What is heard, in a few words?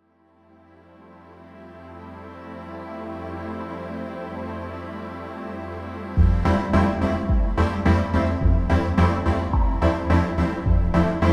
Space Puzzle electronic